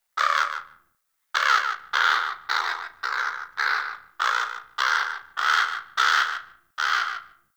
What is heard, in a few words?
Ambient Bird Calls; Ambience; Duviri